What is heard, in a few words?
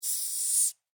Insect Hissing Cockroach Single; Animals:Insects